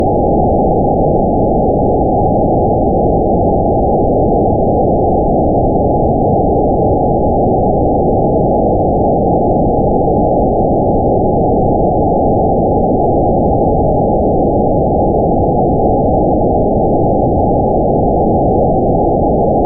dimensional rift; horror

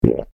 swallowing; human

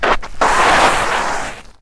soccer sliding; sports

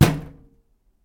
knock on microwave; knocking

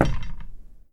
knock on glass door; knocking

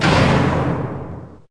big door shut

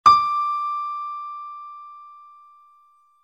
piano D; instrument

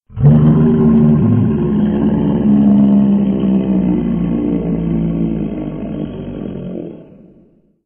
bull monster

